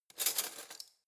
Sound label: cutlery spoons drawer grab